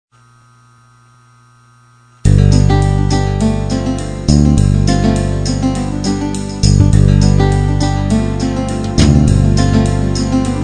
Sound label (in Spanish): Campanas navideñas pista